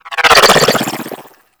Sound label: Flak Drone Proj By